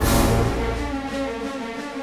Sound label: Orchestral Loops; 128 MANHATTAN